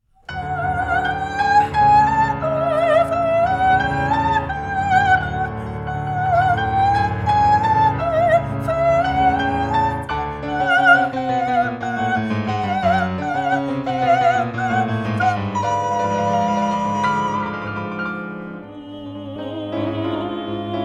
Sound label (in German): wind chor; rigoletto; Bregenz